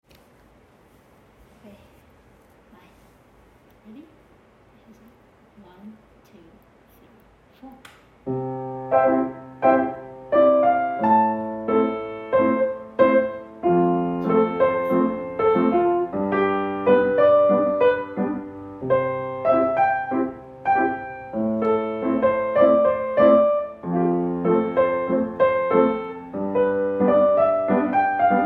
chord progression, Chord Progression Improvisation